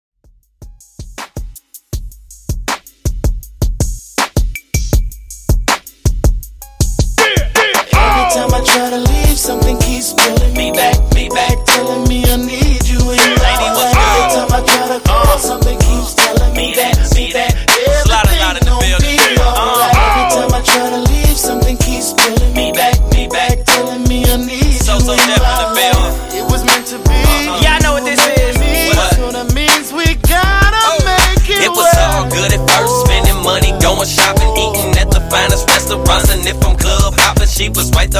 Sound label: HipHop Redrum 160Bpm